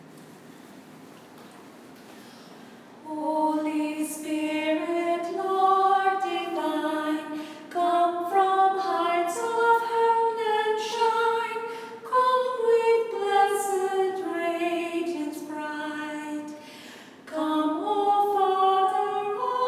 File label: pentecost hymn sequence